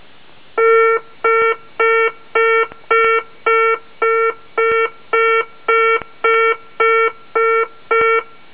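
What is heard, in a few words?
gear horn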